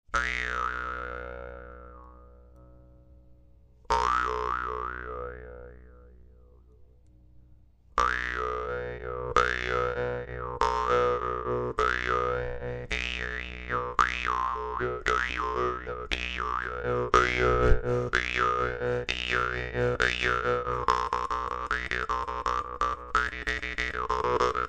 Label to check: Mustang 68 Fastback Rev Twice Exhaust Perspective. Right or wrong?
Wrong